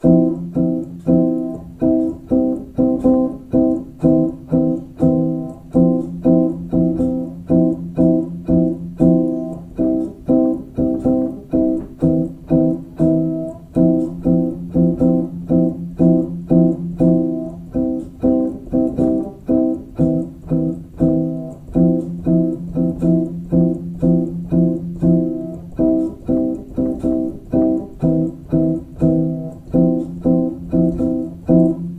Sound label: Upright Piano Chords 120bpm 24 Bars